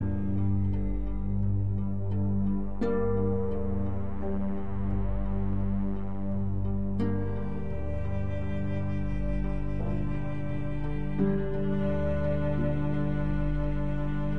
Eerie loop

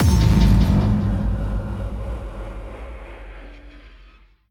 Cave Spider Jump Scare; The Inn

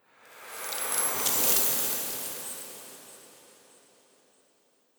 season change winter

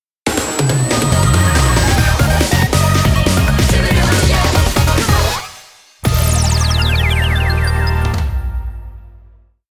JINGLE - Stage Clear; sonic before the sequel

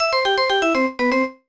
Jingle; Imagine Master Chef; Imagine Happy Cooking

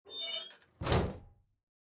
Morgue Door Close; Devour; devour 2021 gamerip pc macos

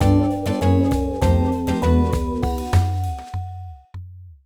Jingle Level Fail

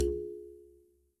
Loop Tail; Good Job

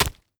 Impact Hit Knife; Deathcam; This Is the Zodiac Speaking; Switch PS4 Xbox One PC macOS

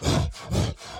Enraged Breathing Loop; The Town; Burns

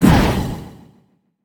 punch

